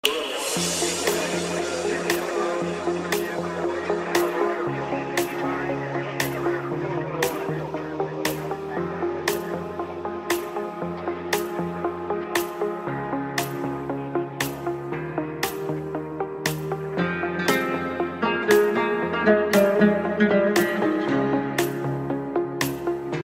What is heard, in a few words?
romantic iphone ringtone